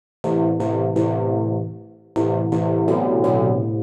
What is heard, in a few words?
DEEPHOUSE ORGAN 125 A